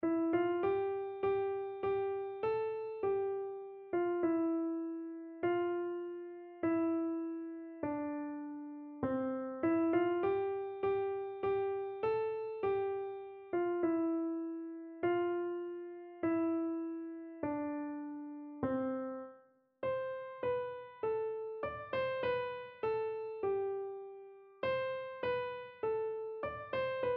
hail holy queen, keyboard

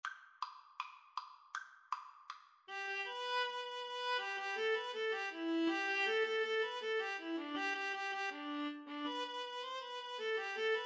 Viola Duet

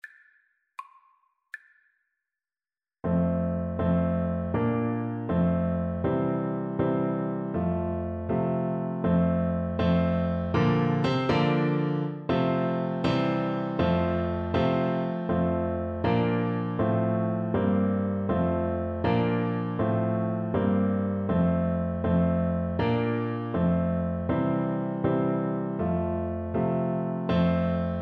for hes a jolly good fellow, Tuba

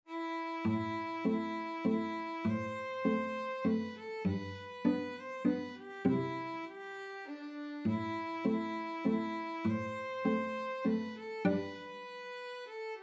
2 violins 2 cellos

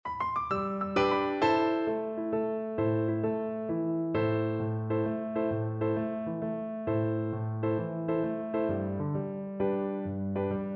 Bassoon

